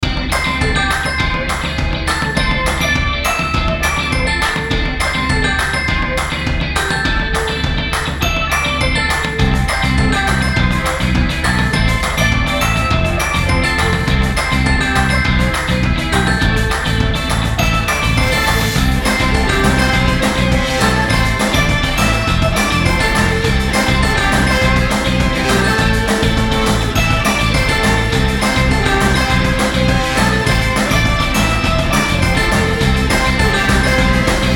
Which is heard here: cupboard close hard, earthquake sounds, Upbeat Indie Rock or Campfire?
Upbeat Indie Rock